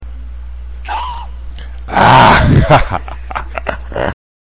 ahhhh

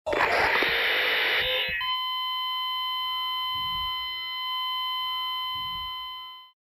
flatline; deadspace

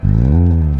bonk

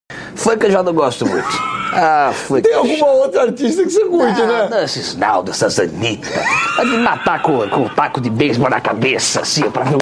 funk

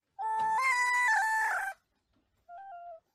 autotuned fox

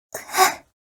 breathing

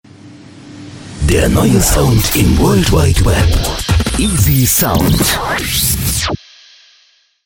jingle; easysound

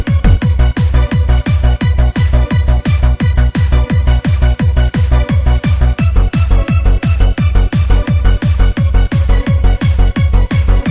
hardcore trance

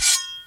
knife; pressure zone

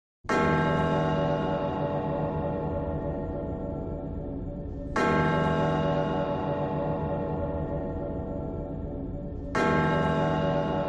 gold bell; one piece

